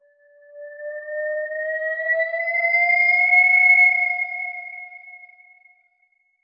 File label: shofar rapture announceme; wind instrument